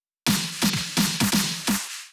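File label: Drum Loop snare Fill, 128BPM; VEC5; Multi Loops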